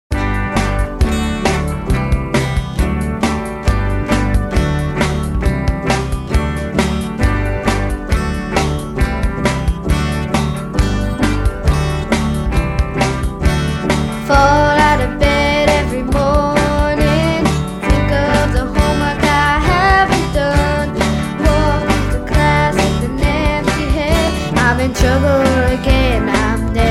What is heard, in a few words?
Rocking my Guitar